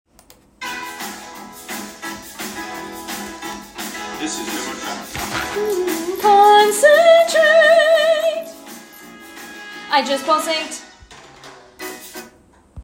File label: Tenor; Fame The Musical - Holiday Pack